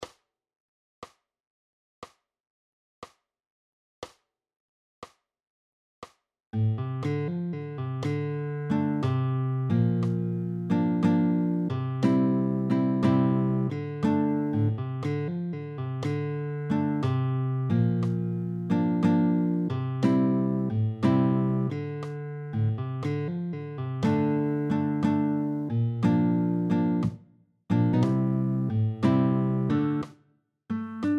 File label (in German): Nylongitarre; 1. Strophe